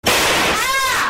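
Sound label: Slap and kick ahhh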